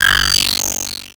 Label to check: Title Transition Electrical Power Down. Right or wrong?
Right